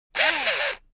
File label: electric drill screw in short; maschinen; bohrer; soundpool